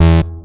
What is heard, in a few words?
106 Bass E, One Shot Bass